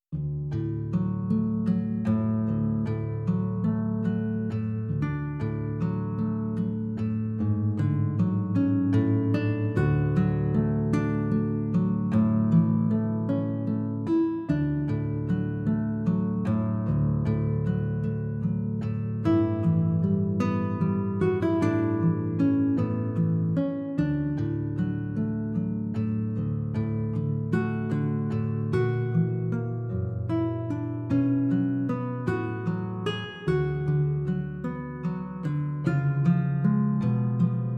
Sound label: theme guitar solo; schubert; ave maria